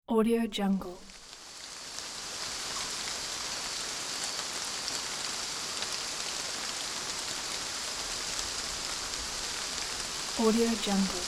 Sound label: rain on leaves